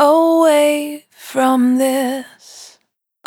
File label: Dry, Single Voice Melodic Loops, Vocal Samples; Sony Creative Software; Pocket Diva World Class Vocal Samples